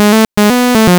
music

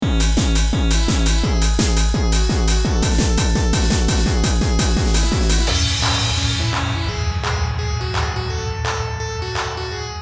trance

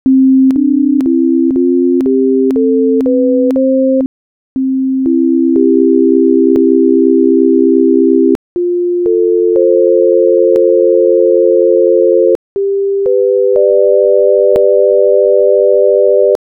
12tet a444

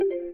start listening; mycroft

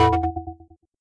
hit death; carbone-ng; carbone mobs

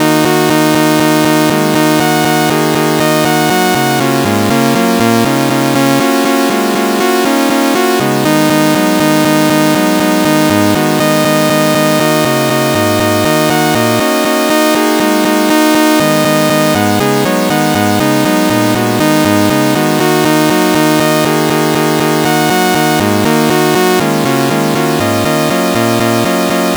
sawtooth minor pentatonic; Minor Pentatonic Scale; music generation library in Rust

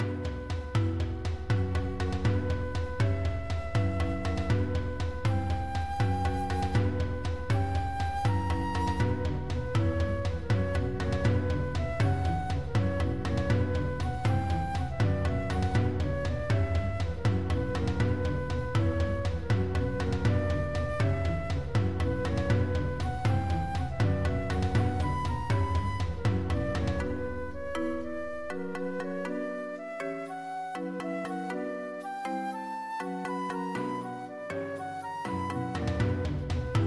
shhh; Shadow Cannibal Resort

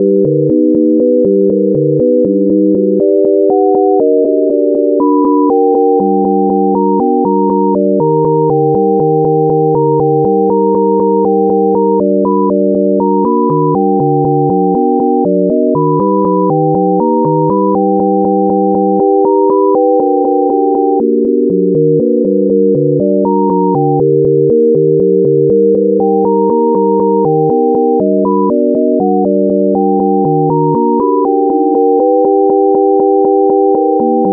sine augmented; Augmented Scale; music generation library in Rust

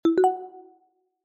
notifs; redwarn

